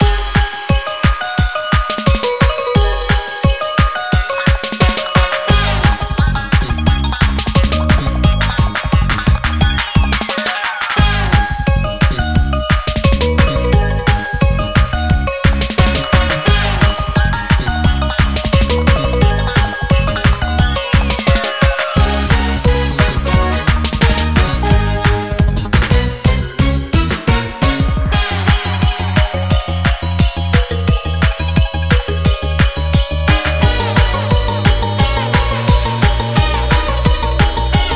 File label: Music Antarctic Main Loop Lap 3; boom karts multiplayer kart racing android